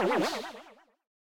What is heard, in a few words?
Miniboss Jump